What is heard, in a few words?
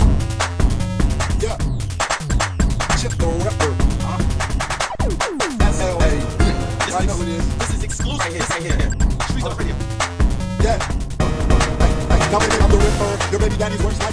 Secret Flute